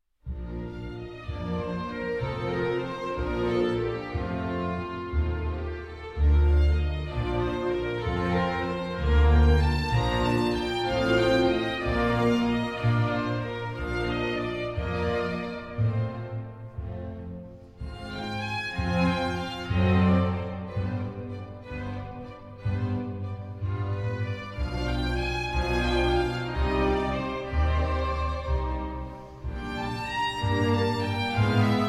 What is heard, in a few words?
Concerto For Strings in G Minor, Andante Molto e Sempre Pianissimo